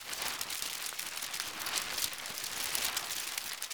money fly

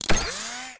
punch

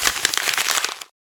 roll paper

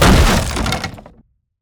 container smash

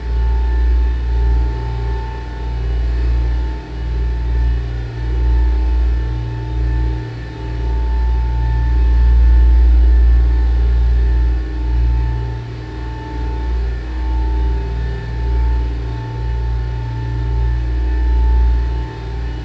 factory low drone

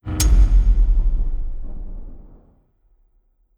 ui bell enter

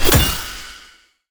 imp magic sword

